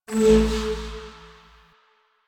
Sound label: Choir Chorus Aura Damage; Warframe